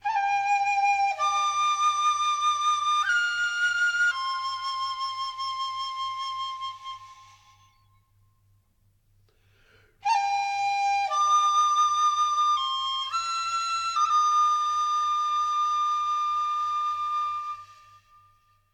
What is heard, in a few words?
Grasslands Flute; Age of Conan Rise of the Godslayer